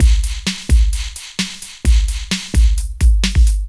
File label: drt 130 electro, DRUMLOOPS, LOOP ELECTRO